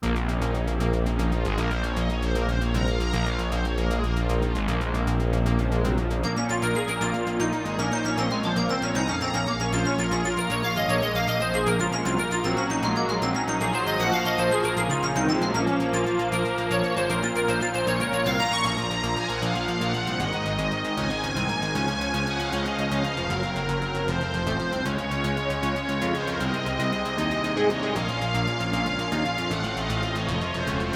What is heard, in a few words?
AWE32